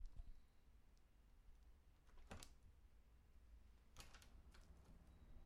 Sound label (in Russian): malenkaya dver otkrivaetsya i zakrivaetsya